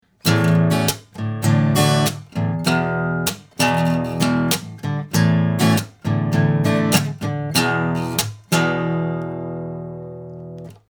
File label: Git neutral 160 Hz